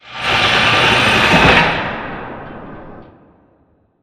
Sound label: Prop Rack Curve; Prop Warehouse; Titanfall 2; Boomtown